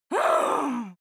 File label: female groan angry; koerpergeraeusche; menschen; soundpool